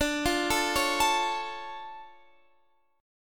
D Minor Major 7th D

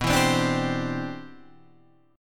C Major Add 9th, B, guitar chord

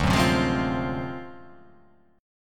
D 7th Sharp 9th Flat 5th D down, chord

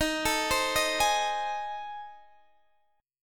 A Minor Major 7th Flat 5th Eb

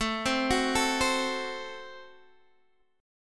A Minor 6th, Guitar DADGAD

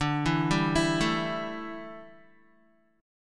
D Suspended 2nd Suspended 4th D down Guitar

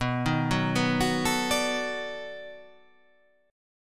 B 7th Sharp 9th, down

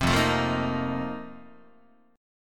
F 11th F, down, Guitar; F11 strummed

